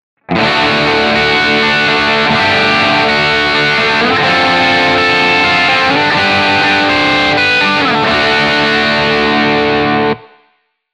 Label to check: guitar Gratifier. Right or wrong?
Right